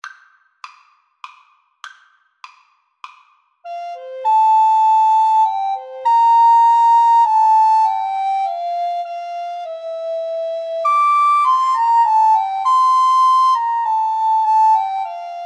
dona nobis pacem recorder trio

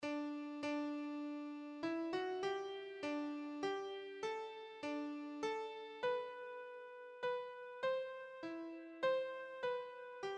god glory, keyboard

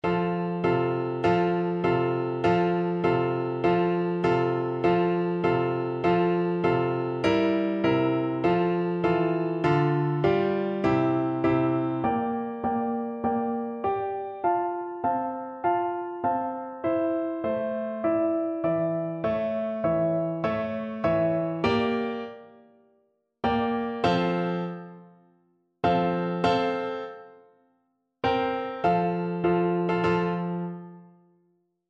bizet carmen, Tuba

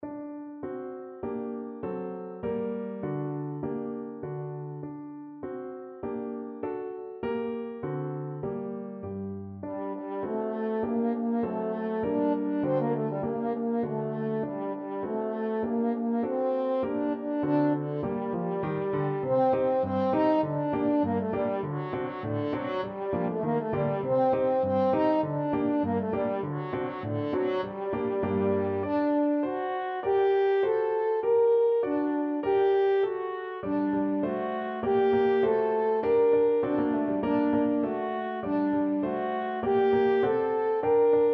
Sivivon, french horn

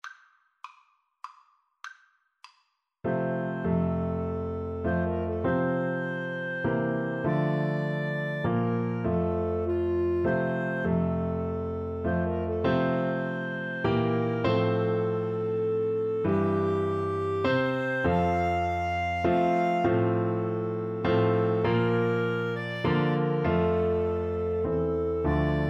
amazing grace, Flute-Clarinet Duet

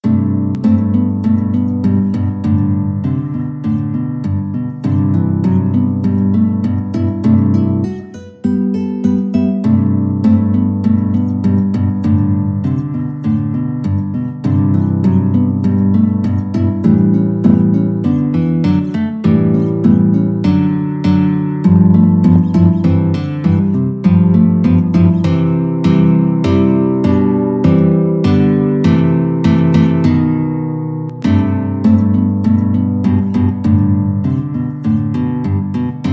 chorus, guitar

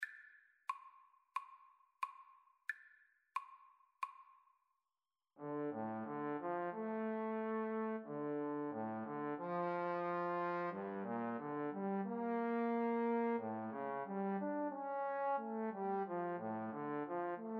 wayfaring stranger, Trumpet Trombone Duet

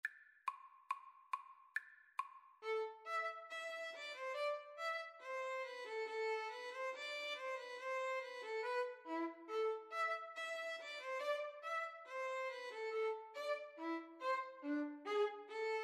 gavotte, Violin Viola Duet; lully

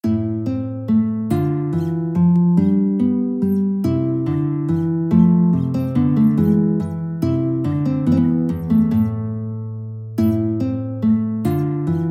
Minuet, guitar; Krieger

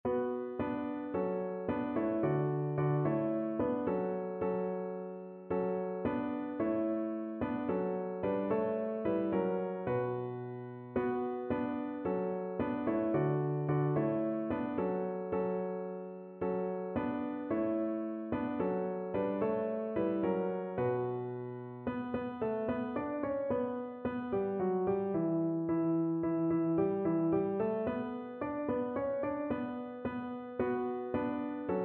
piano

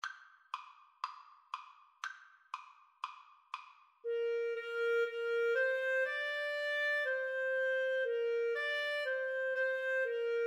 Clarinet duet

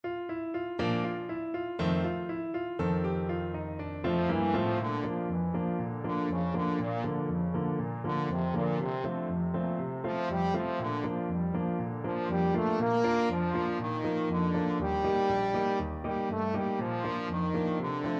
trombone

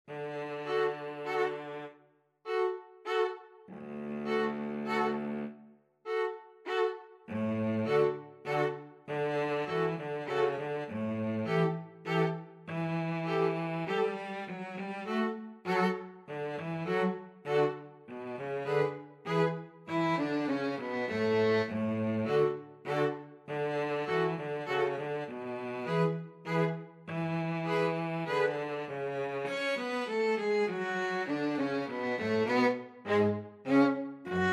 saint saens elephant, 2 violins cello

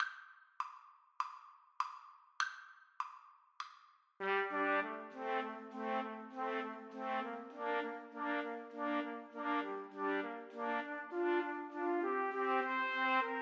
idylle, Trumpet trio

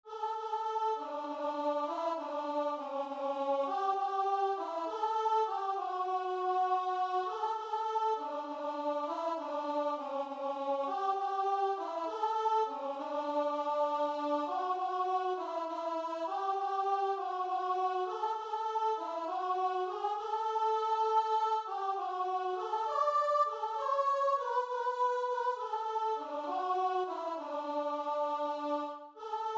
faith is the victory, guitar and vocal

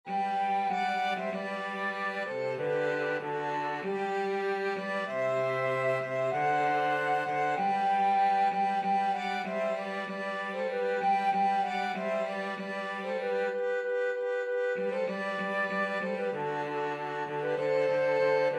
joy to the world, flute violin cello

